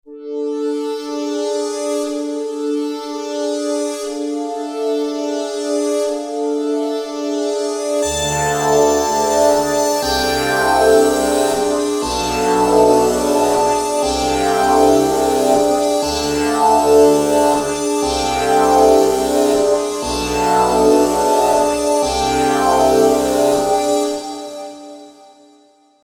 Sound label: eerie